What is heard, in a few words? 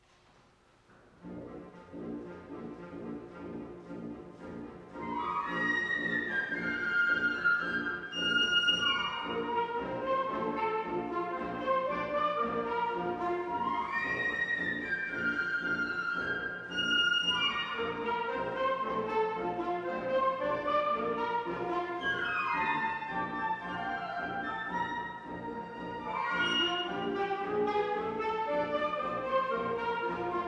Chinese Dance, band